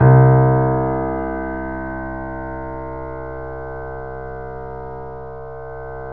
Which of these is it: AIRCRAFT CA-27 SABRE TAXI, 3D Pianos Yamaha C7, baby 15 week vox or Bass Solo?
3D Pianos Yamaha C7